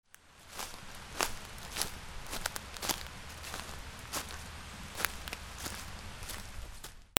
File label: FOOTFALL